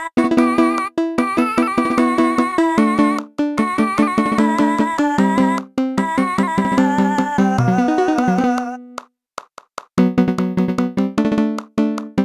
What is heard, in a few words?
Flamenco (Aircheck, Hi-Fi)